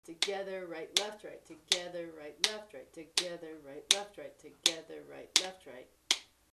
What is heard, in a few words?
rhythm, marimba; kare mugomba